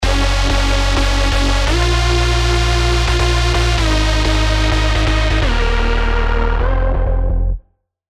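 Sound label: Reese, bass, Serum; Reese Vision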